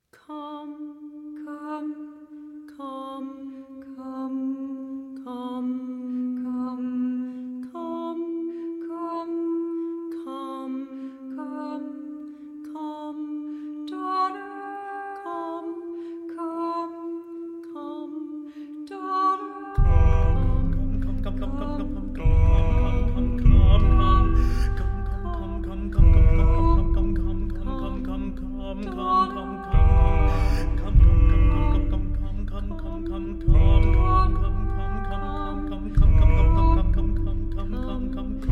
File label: Version For 4 Voices & Percussion; No. 1, Come, Daughter